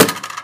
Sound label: plastic box impact bullet